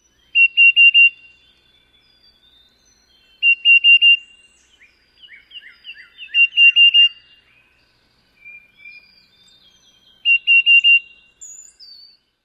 songs

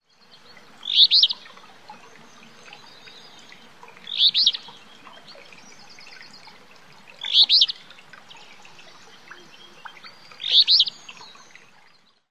song

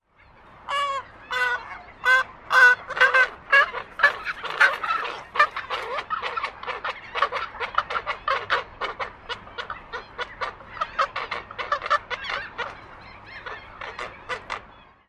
sounds at colony

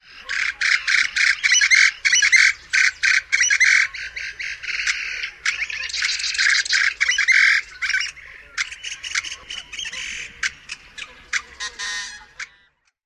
complex outburst

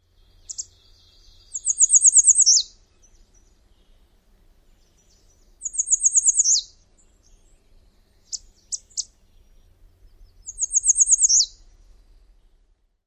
song samp calls

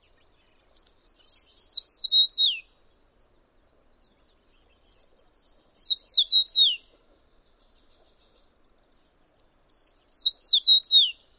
songs

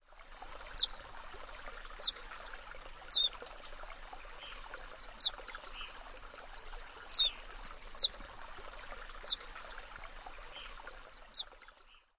chip call

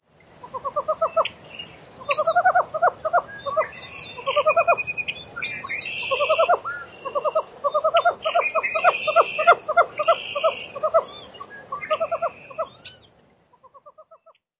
female stuttering calls

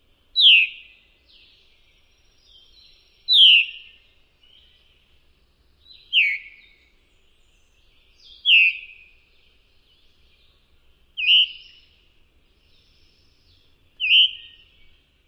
calls, Veery

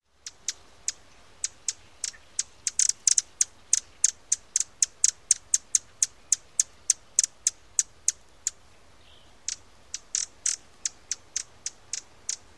foraging calls

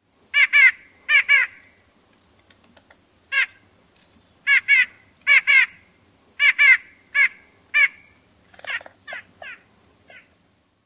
yank calls

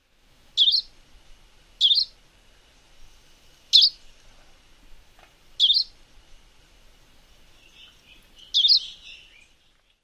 calls; AZ

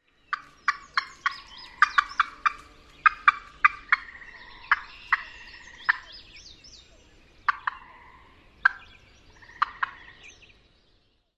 female alarm calls

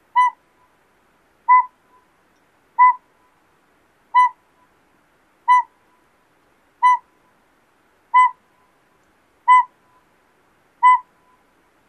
toot series

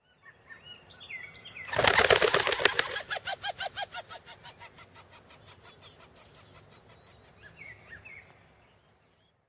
taking flight with wing whirr

